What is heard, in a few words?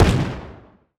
exposion enemy small; CrossCode; battle